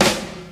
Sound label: snare drum sound g sharp key; snare drum one shots